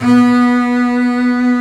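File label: Bass legato B3; Digital Sound Factory Virtuoso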